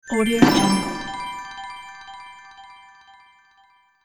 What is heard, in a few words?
reveal magic explosion